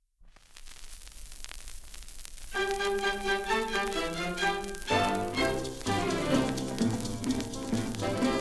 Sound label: samba